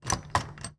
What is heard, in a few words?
Household Doorknob Turn